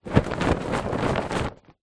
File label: household pillow case shake